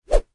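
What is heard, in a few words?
whoosh small; snow white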